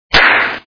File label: slap